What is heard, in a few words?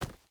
footstep hide forest run; Rust